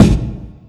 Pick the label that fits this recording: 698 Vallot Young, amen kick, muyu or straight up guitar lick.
amen kick